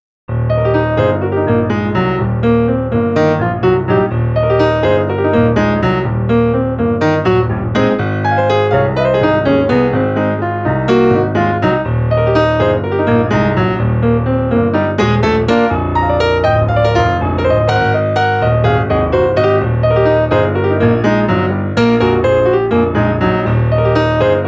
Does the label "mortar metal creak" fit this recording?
No